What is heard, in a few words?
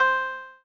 Koto C6